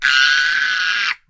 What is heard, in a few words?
screech